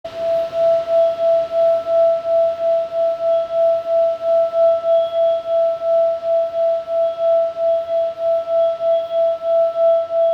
Type B E

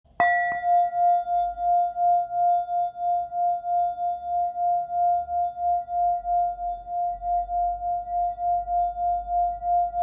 Hammered F; singing bowl; Flower of Life Purple Rounded Meditation Singing Bowl – 8″D

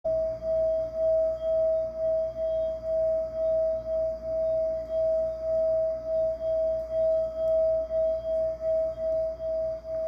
Coppery Brown Dorje E, meditation singing bowl

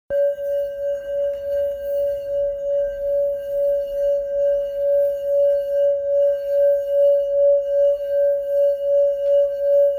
Purple C; Flower of Life Purple Rounded Meditation Singing Bowl – 8″D

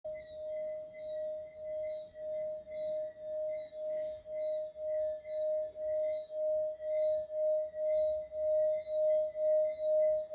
Beaten Gold D Sacral, meditation singing bowl

